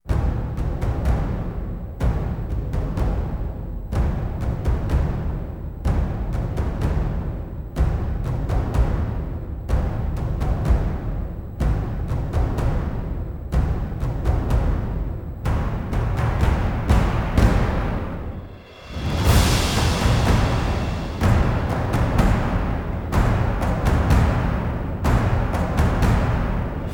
drums

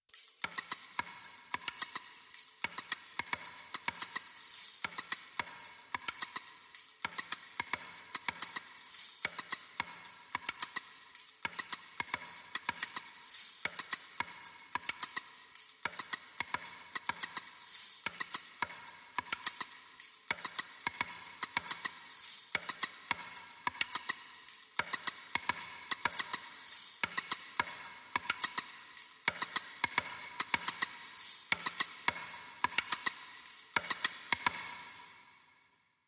perc high

